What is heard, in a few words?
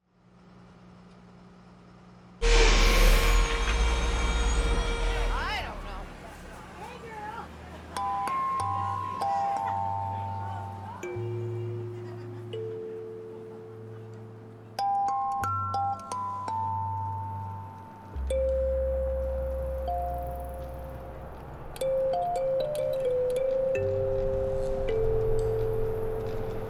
Theme with City Sound Orchestra surround mixdown; QLAB; overture center for the arts madison wi